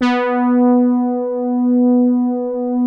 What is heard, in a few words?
POLY HARD H3, SOFT BRASS; Music Store - Claudius Diemeter Schwerlos